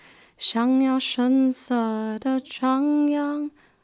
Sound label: Alto